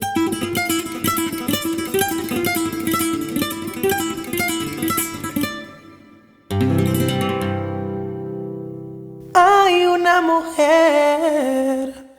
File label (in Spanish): MUSIC SOUND; Spanish; Soundpad